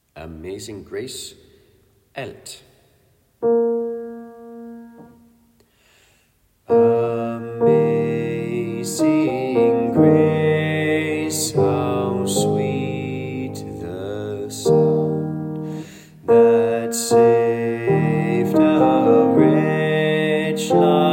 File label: Amazing Grace alt; laudate kirkens folkekor